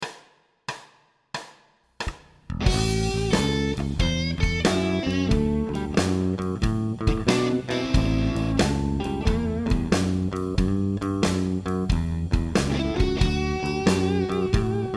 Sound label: 12 Bar Blues